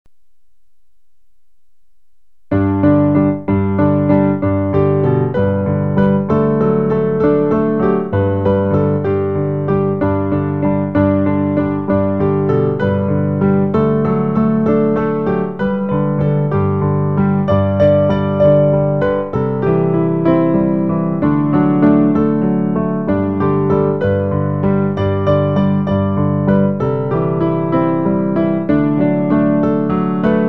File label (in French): piano; Jésus quitta le trône de Son Père; Pour accompagner le recueil Louez Dieu.